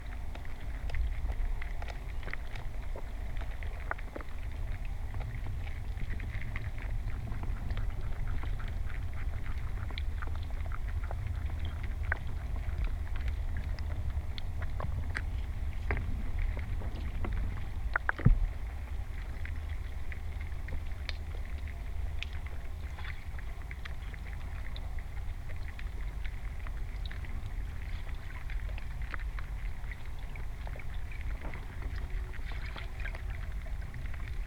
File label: Great Pond Snail Hydrophone